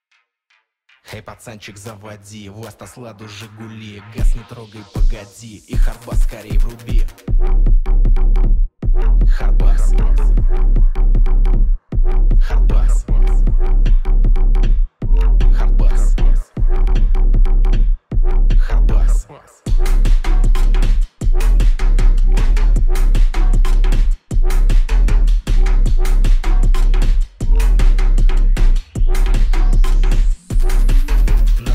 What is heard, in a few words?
Bass; Lada